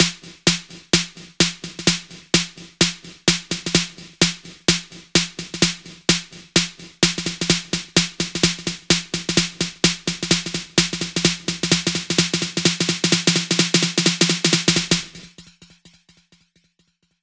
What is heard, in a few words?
128 BPM; Diamond; 8Bars; Vengeance Samples; House&Electro Fills